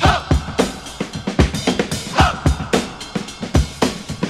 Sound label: assembly line, breaks